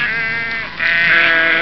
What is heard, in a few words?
Police Sheep!